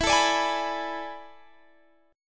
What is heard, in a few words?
A Major 7th Suspended 2nd Suspended 4th E, chord